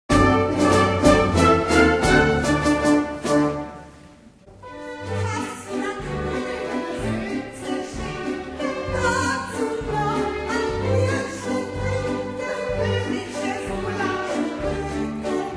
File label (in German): Kuechenwalzer, Zille-Musical